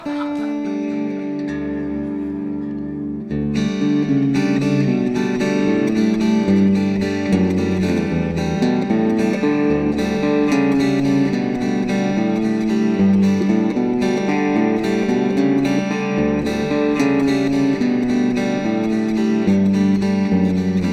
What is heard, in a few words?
Elderly Jig Music